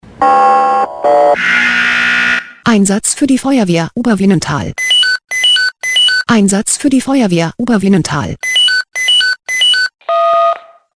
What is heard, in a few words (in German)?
SMS Alarmierungstone; FW Oberwynental